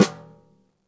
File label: snare snares on; JazzFunkKit